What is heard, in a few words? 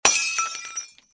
Breaking glass